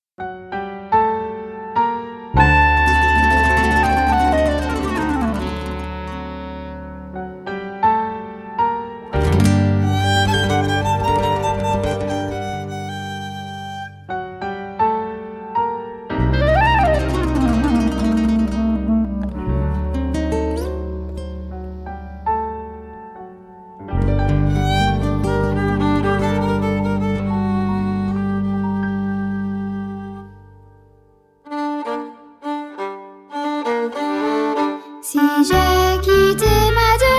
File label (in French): violon une sardane